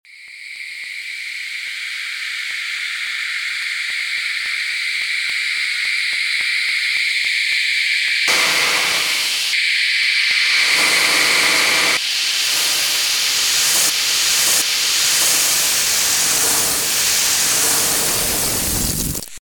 Air White Noise

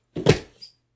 Human Knocked Over

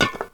Can Count

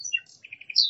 Bird Pajaro

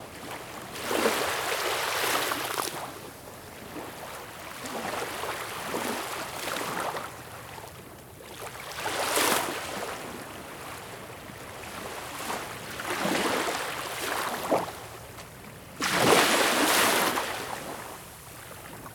Waves At Baltic Sea Shore

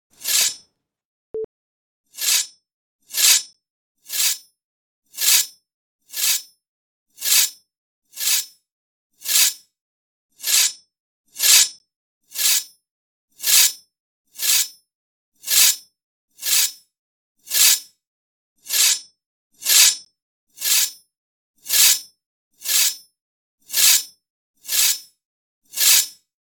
Sword Draw

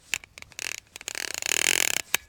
Blocked Cog

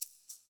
Maracas One Shot Slower

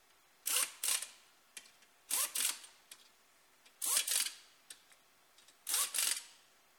Wheel nut air gun

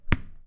Ball Hit Ground

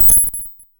Blip

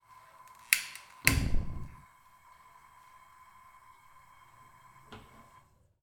Gas Stove Fire Start Lighter

